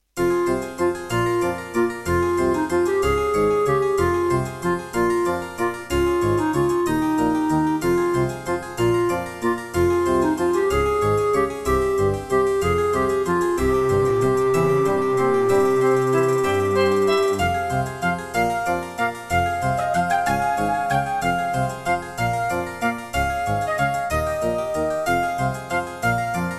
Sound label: Serdaco MP32L_MT32ROM; Death Gate